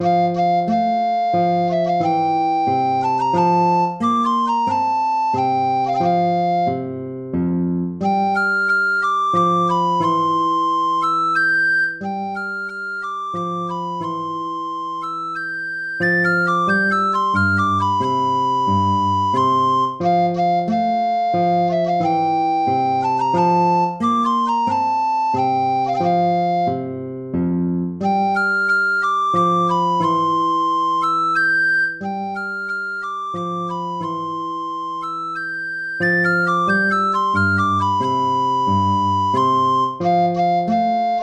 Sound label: minuet; mozart wolfgang amadeus